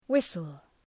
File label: whistle